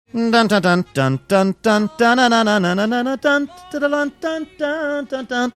singing portion

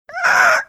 making weird squeaky sound

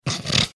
snorting

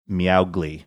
meow

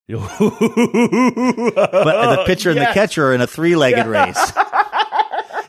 having good laugh; new ideas baseball

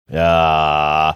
drawn out aww

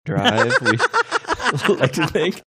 awesome laugh

